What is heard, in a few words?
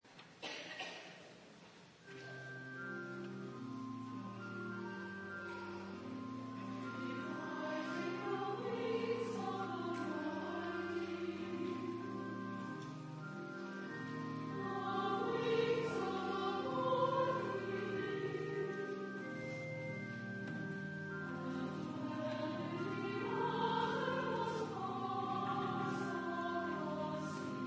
worship anthem